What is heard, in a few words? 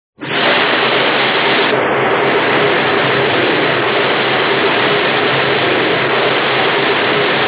flood Tanks